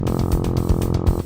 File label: cat noise